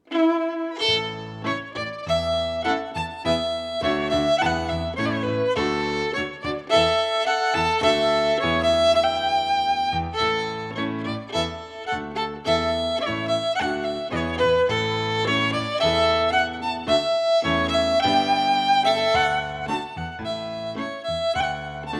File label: Massed Bands